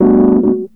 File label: Rhodes, Keyboards; Total Drum&Bass